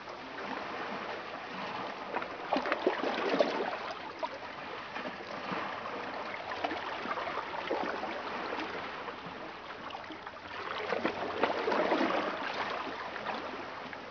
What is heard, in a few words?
lake water, ambience